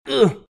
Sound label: hurt; RadwinIsland